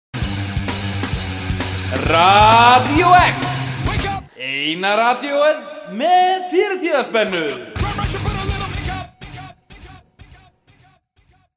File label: jingle; Radió-X